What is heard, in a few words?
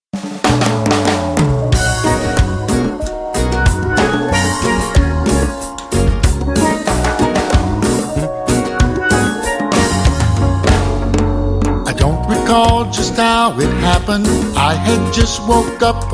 Tropical Country